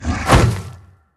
inferno boss horns

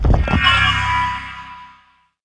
axlethrower totem spirits

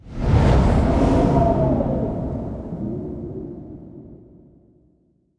cloud Removal; ui Map

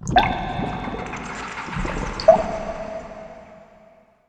dripping con bichos AMB

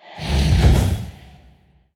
halloween bone dragon attack

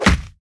quick feet melee; crocs

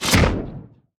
enemy soulcaller attack cast

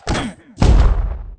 panda style

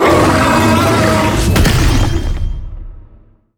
crocs abominor grow

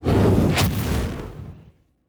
transform to buffed; tenshi

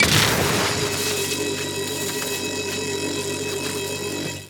tower eldrictch Channelers basic Attack long

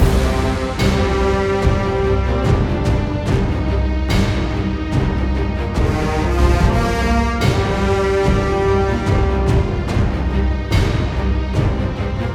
bgmusic battle

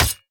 tower dune Sentinels basic Attack

